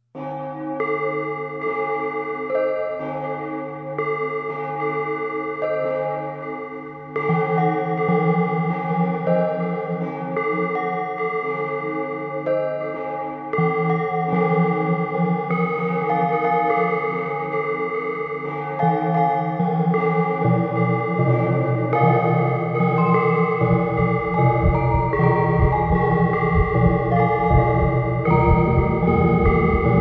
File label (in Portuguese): sinos da alma